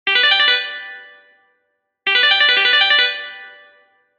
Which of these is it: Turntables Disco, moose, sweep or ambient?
sweep